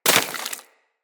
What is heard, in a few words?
Bullet Impact Debris Snow; Weapons